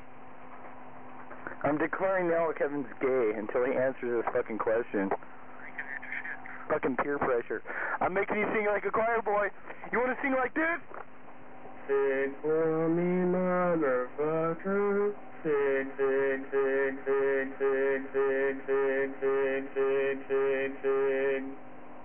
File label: sing